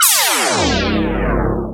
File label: scifi, SCIFI & SWEEPS; Techno Trance Essentials